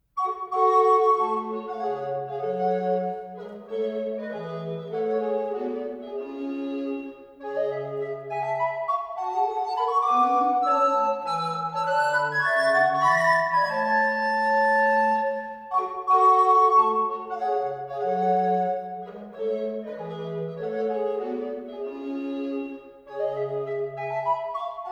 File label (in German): Blockflöte, Holzblasinstrument; Musikschule Region Gürbetal